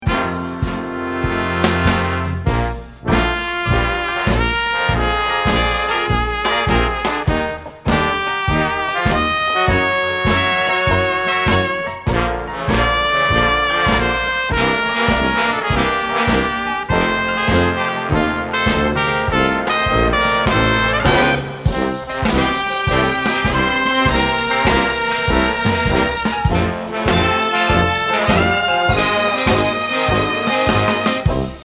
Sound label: karaoke